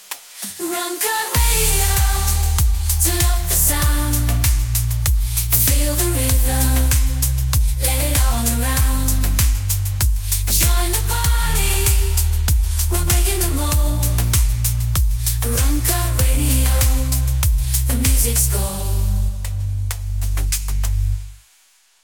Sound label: Ringtone; Ramkat Radio